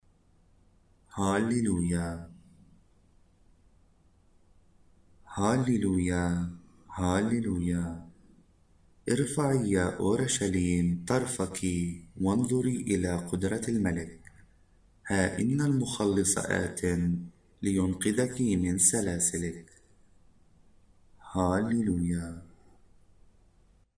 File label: Halleluiah, arabic mass